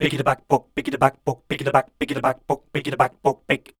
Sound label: scat, voice